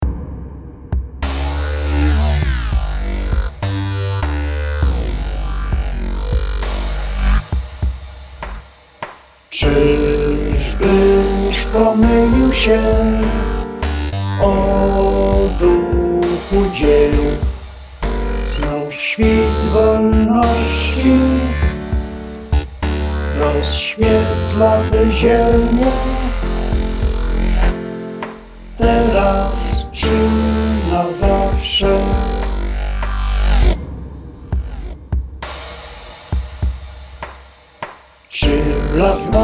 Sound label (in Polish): Chor